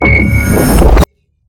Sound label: prefire; smite; god atlas